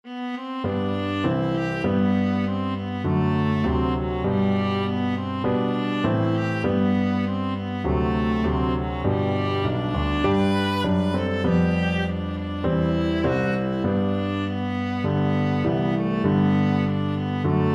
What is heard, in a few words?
wildwood flower, viola